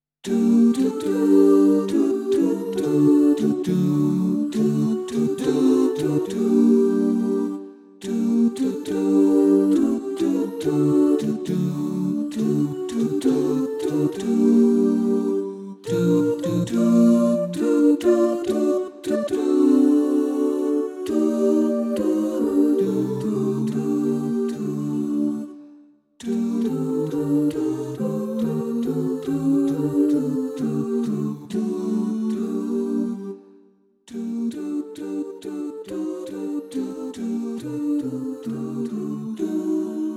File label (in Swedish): Tenor; Gladjens blomster